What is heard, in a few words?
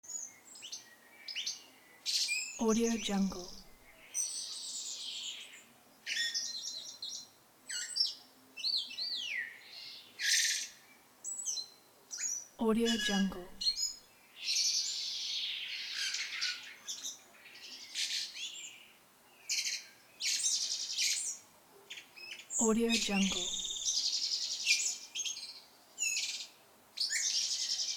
birds